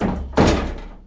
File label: open garage, street traffic; best service Blue Box